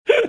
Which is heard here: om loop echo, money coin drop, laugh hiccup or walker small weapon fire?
laugh hiccup